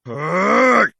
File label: effort; tf